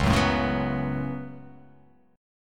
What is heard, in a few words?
C sharp 7th Suspended 4th Sharp 5th C sharp; down; chord shape